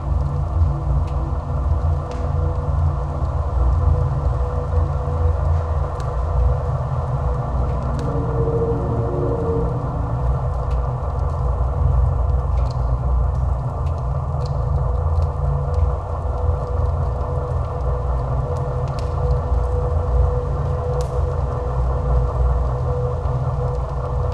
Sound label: amb drone spinning top